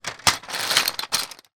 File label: board game tiles movement; rummikub